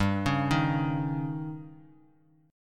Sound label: C sharp Suspended 2nd Flat 5th G